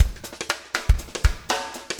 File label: breakout 120, 120bpm, loops